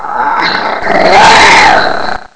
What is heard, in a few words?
creature